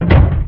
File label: Projectile Loaded